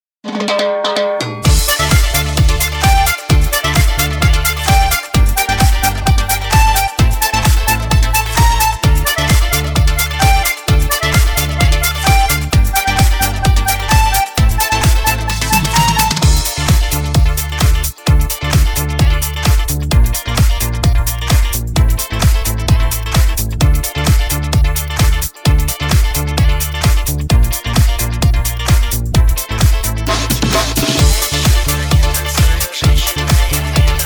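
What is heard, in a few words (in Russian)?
krasivyj proigrysh bez slov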